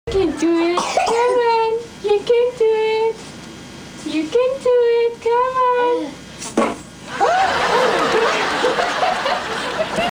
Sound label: baby fart